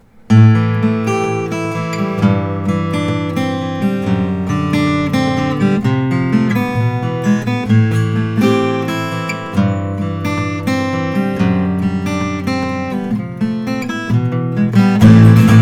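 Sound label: Collings 002H Adirondack acoustic guitar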